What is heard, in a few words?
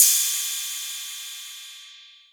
808 Cymbal